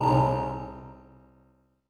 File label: gate